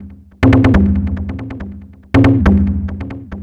NRG Percussion, Trance Drums; Sonic Foundry (Sony Creative Software); Trance NRG VOL-1

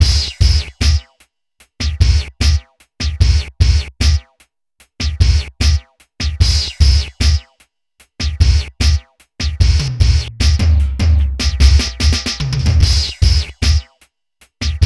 TRIPHOP; INIS; Funky Xtreams

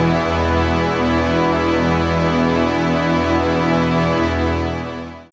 SYN DANCE; Sound & Vision - Gigapack II

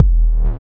BUZZER; Spectrasonics; Metamorphosis

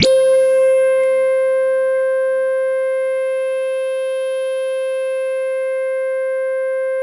JV-1080 RESOTRAIL; VINTAGE-EXP

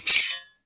SWHIT, SWORDHIT; Total Control - Action Cutz

